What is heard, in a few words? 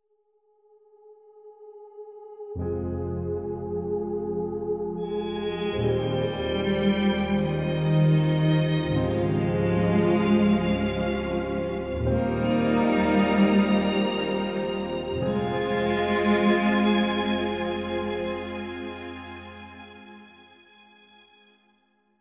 opaque; gris